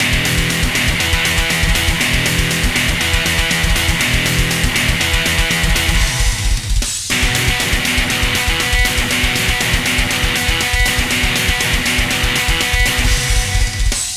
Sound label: Fast Metal Loop